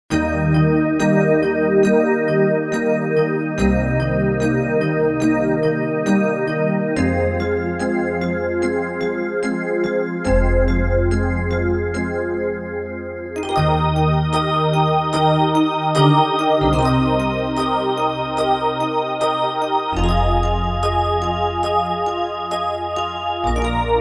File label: MIDI EPiano; Factory Patches